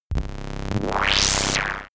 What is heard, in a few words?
high voltage